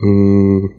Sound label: "mmm", Voice; ooh ahh